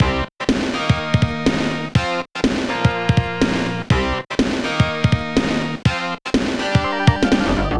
Intro - 123 bpm

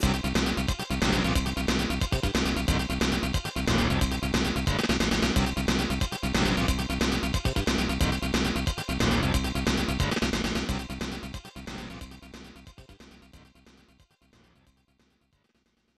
Lose Battle; human grand prix 1992 snes

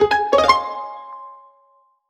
Jingle Stalemate; Clubhouse Games: 51 Worldwide Classics

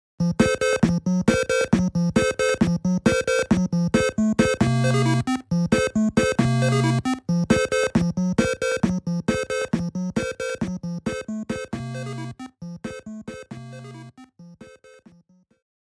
Small Hit Lightweight

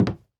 Footsteps Sneaker Wood Walk

